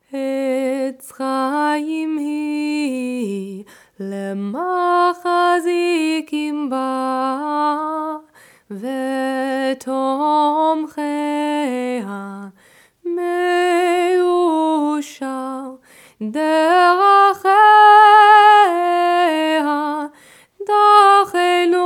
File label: Etz Chayim slow; high holydays childrens family services